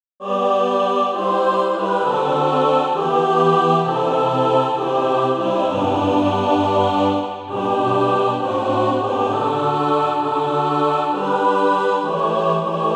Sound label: mixed